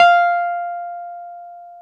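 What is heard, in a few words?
piano, Wurly, Keys of the 60s and 70s; Roland LCDP10 Keys of the 60s and 70s 2; Wurly 200B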